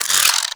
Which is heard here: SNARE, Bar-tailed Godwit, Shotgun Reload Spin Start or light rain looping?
Shotgun Reload Spin Start